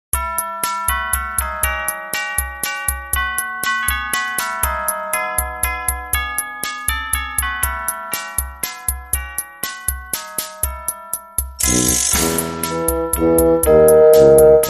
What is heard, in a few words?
We Zap You A Merry Xmas; Trad (PD)